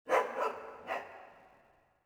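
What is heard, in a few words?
village dog, colombia; ambience; Soldier Of Fortune II Double Helix; XBOX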